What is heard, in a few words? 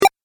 Game Positive Jump, video game